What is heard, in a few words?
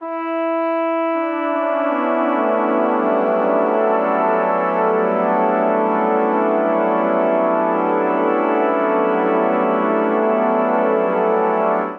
Phrase Wash of Colour D Major 80 bpm, Brass Quartet; MIDI Clips; Ableton; Spitfire Audio